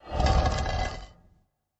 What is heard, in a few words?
Rockslide; Legend of Keepers (gamerip) (2019)